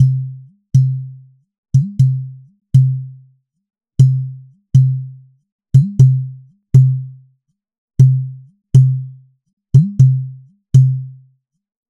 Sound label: Udu (Ibo) Side Hole; Udu (Ibo) & Cajon Drums